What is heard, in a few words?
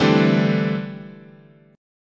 D 7th Suspended 2nd Suspended 4th, chord